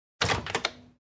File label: Plastic handle pushed down